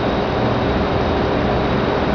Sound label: machine hum; environ; Gunlok